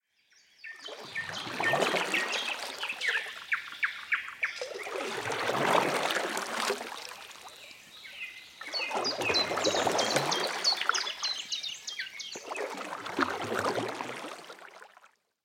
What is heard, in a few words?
Lake Canoe SOUND